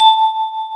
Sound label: digi decay; AKAI S6000 CD-ROM - Volume 1; SYNTHESIZER